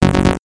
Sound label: Error